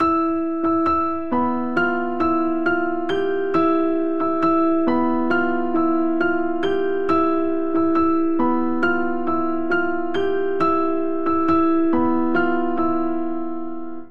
Vamp Phrygian Octaves E Minor - F Major 68 bpm, Grand Piano; MIDI Clips; Ableton; Factory Packs